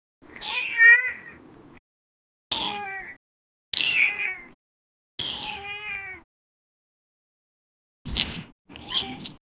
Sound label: cat sounds claws slippin